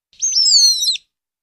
Two, Short, High-pitched, Overlapping Squeals ( I.e. Bat Or Rat Squeals ).; bats